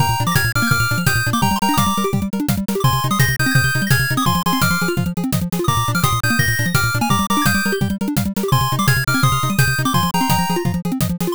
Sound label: bgm drive